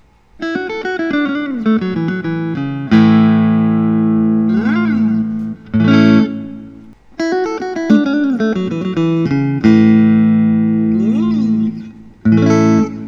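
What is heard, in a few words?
suhr standard limited edition honey burst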